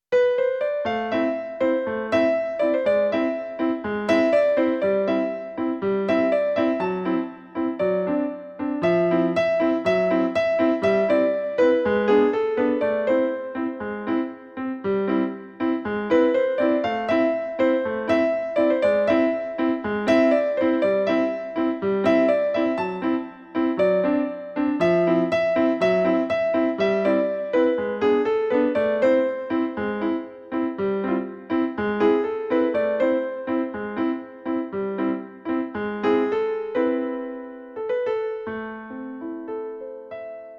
ctk-6200 grand epiano

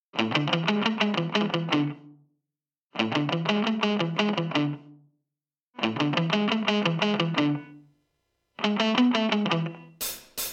BURNY RSG55`63

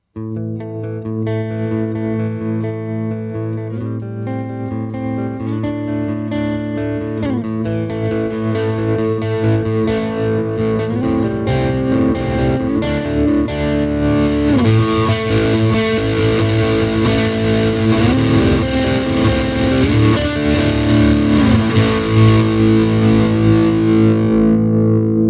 dyna amp + trem; Cube 40XL; Dyna Amp + tremolo

